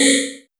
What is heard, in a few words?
Buchla Modular percussion; Buchla Modular System 200; Drums